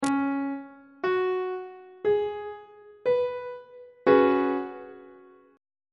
d flat dominant 7th suspended 4th chord on treble clef